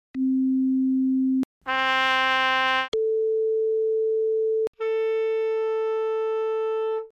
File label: instrument comparison